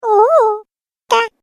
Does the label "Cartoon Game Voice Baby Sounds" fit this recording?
Yes